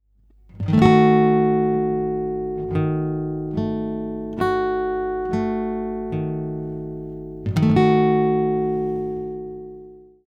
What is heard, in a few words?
Ddim, guitar